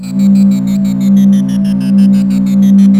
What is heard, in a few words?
CYBORG, OLD SYNTHS; Rubber Chicken Software Sample Bank